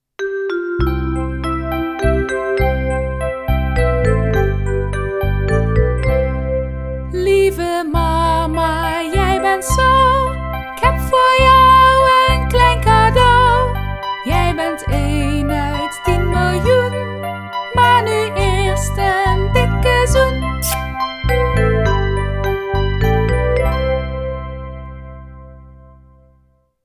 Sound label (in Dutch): metzang; Genre: Blues